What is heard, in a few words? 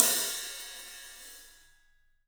ZIH13, 3D Drums, LITE ZILDJ H; 3D Sound